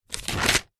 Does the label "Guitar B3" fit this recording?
No